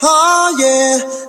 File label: "huh yeah" SUNG